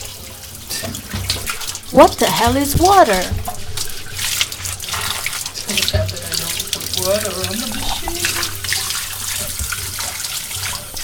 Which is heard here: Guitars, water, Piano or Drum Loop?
water